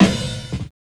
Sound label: SNARE